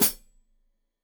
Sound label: Hat Foot, Hi-Hat, Cymbals Stereo